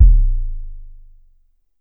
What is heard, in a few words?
Drum X Sub, Pearl Drum-X